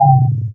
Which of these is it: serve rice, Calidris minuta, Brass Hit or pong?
pong